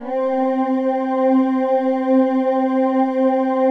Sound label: PAD; Best Service; XX Large; Pads